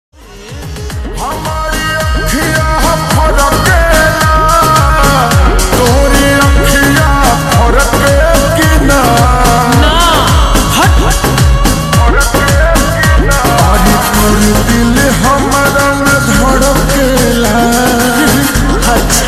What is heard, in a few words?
Slowed Reverb Ringtone